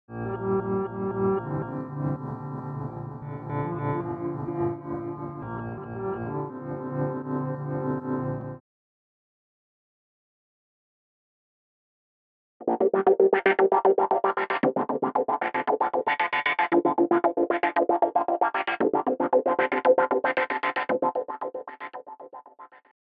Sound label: still ambient